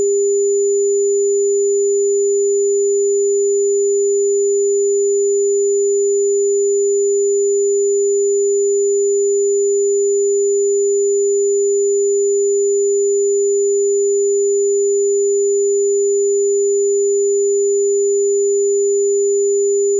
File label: Intermodulation Distortion; Rohde & Schwarz; UPA; Rohde & Schwarz UPA-CD